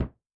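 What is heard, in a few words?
Gran Cassa Mute, Kick, Yamaha DTXpress-II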